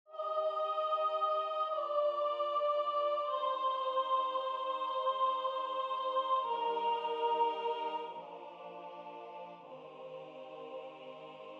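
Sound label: soprano; sanctus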